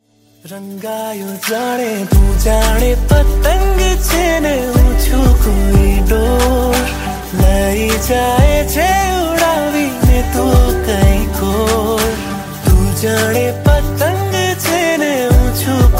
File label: gujarati version ringtone; bollywood